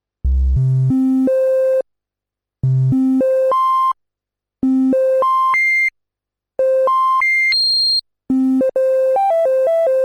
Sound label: Moog Little Phatty Stage II oscillatori